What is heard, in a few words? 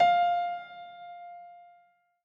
dial tone piano; OnePlus One China